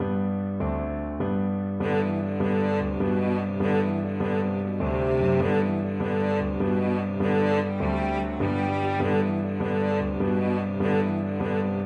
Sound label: another jig, cello